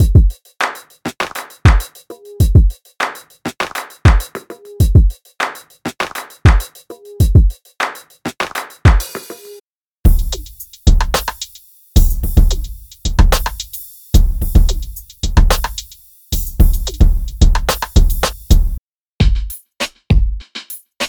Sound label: Drum Ambient Downtempo; Drum Monkey; Unison MIDI Wizard Plug-In; Drum Demo